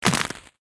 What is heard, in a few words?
step cold